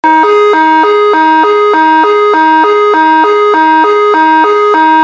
INTERMITENTE, sirene; sirene orbe

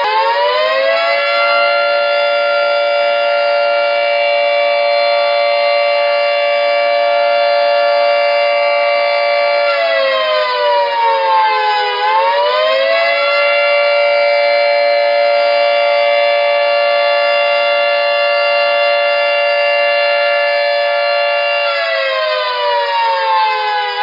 INDUSTRIAL, sirene; REBT 27C20

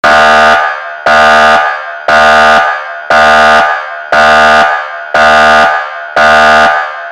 HORN INTERMITENTE, sirene; sirene orbe

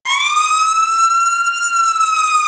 wail lento, sirene; 27C10 NOBREAK; sirene alta potencia incendio

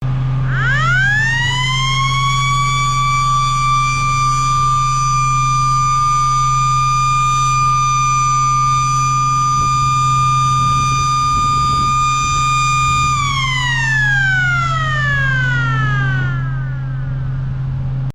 Som, sirene alta potencia incendio; EG-101; eletromecanica; Engesig Sinalização e Veículos Especiais

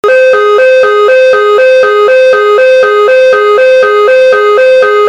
INTERMITENTE, sirene; sirene orbe

